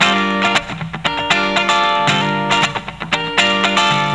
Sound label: funkybaby mono, audioloops